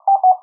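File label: low battery; miro-e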